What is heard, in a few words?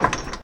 MACHINE Press Machine Light Rapid; loop mono; MACHINES & MECHANICS; Universal Sound FX